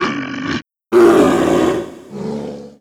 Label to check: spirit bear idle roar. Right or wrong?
Right